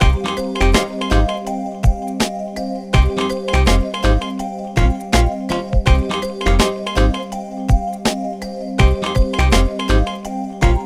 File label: Bpm82 Loop R